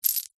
household jewelry necklace silver charms place into hand; zapsplat